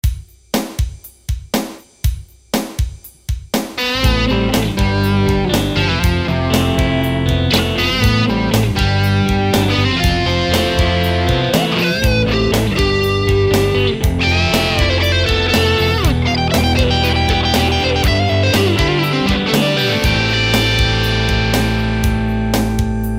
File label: solo